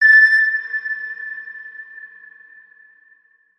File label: Ping Tiny Beacon